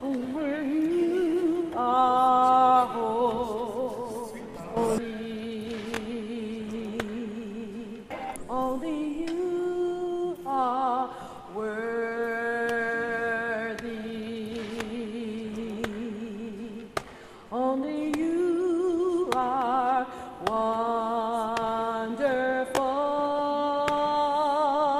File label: Alto